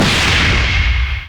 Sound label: kick